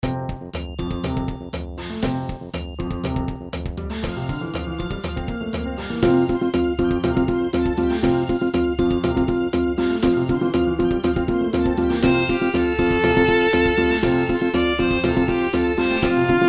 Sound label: Generic Happy Music; katawa shoujo beta version gamerip